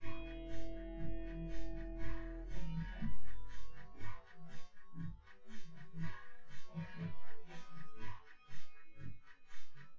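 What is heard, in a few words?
with drums synth conv 1m sim; SASSEC07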